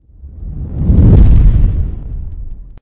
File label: swoosh